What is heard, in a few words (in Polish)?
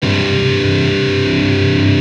akordy G c g; silove akordy